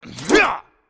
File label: Knight Attack; Character Knight Attack